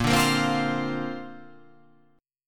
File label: A sharp Major Add 9th